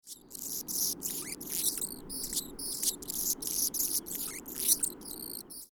Annas Hummingbird, bird songs

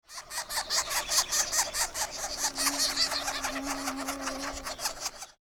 Northern Flicker, bird songs

